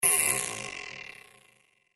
slowing down

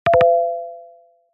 point blank

